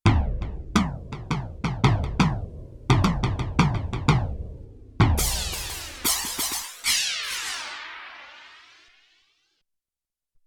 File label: PEG Flanger, Cymbals & Bass Drum, Yamaha TX16W